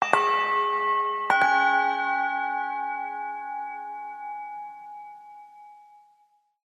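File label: Music Village Bell D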